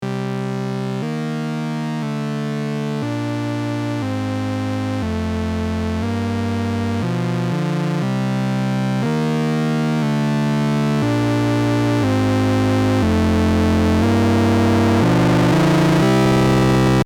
Paraphonic Feedback

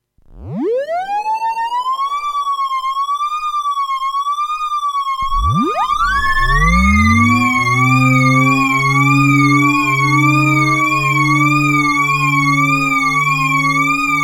SFX Cops

